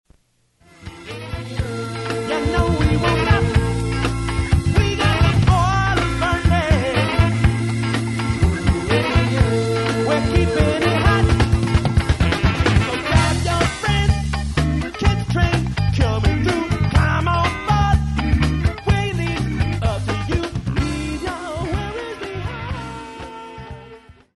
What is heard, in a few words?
Grooveline